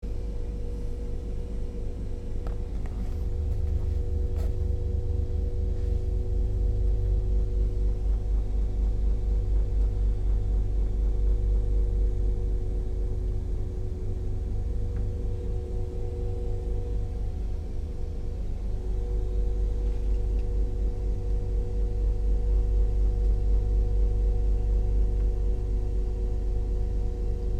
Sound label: hytti cabin ship; ambience